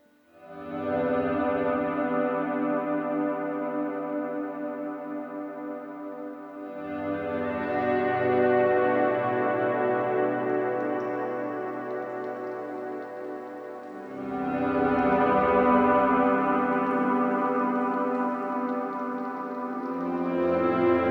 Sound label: Heavy Rain Sounds